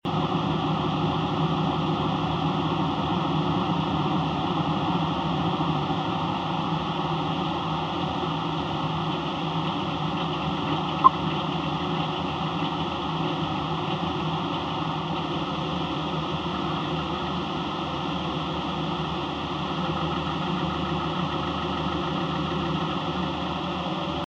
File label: Cinematic Mechanical Ambient Loop; ezra the stranger